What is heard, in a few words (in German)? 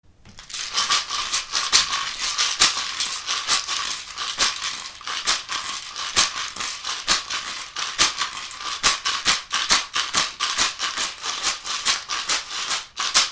rassel, schamanische rasseln